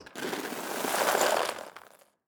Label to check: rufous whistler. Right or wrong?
Wrong